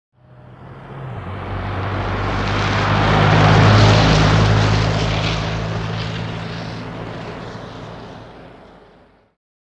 Automobile; By; Austin Ruby Up To Mic Changing Up G